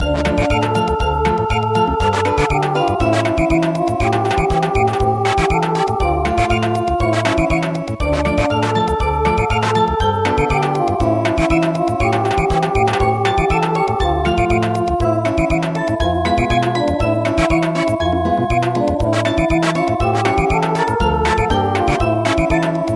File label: Samba